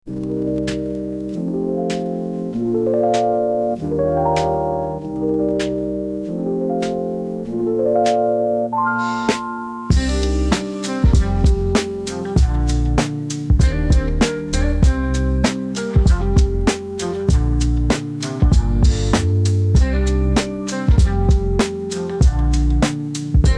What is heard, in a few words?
Elevator, hold music